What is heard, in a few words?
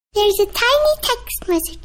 super cute sms